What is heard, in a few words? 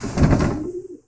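Bird Trapped wood; Project Zomboid v41.73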